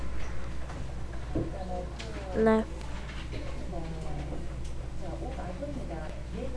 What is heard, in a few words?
laugh